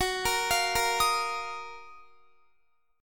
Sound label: Gb Major 13th, down, Guitar; GbM13 arpeggiated; JGuitar